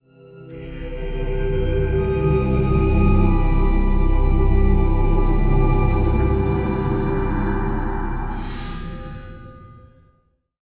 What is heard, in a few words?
temple; spawngirlsounds